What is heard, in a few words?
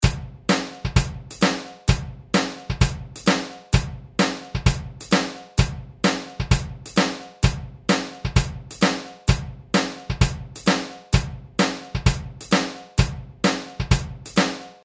country, 130 bpm